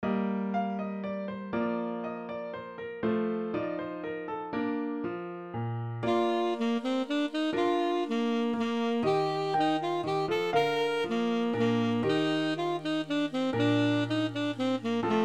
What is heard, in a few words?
Alto Solo